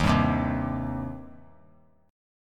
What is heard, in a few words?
D sharp 6th; strummed; JGuitar